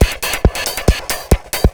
138, Techno, drum loops

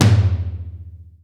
DBL TOMS; Greytsounds VOL-1

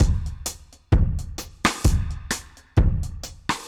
Drums Wet 130, dub drums samples, 130bpm; musicradar

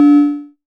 Cowbell Low, Modular

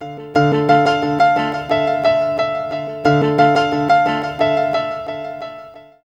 PIA FX, Hip Hop R n B; USB Soundscan vol.19; AKAI

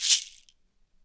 SHEKE, Afro Shakers, Shekeres

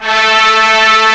HORN; BRASS1

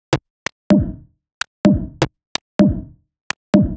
Synth whisperer 127bpm, House Drumloops; 90 s Sample CDs; Zero-G House Fabrik Drumloops; ZG Drumloops

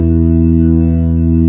B3 E 1, organ; AKAI S-Series CD-ROM Sound Library VOL-1

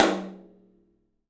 OPEN TOM; 3D Sound; 3D Drums

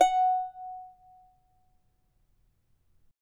charango F#4; Spectrasonics; Hans Zimmer Guitars VOL-2

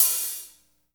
HH hh; Best Service Gigapack 2

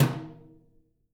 BRUSHES, Living Drums

